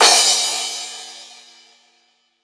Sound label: Crash, Cymbals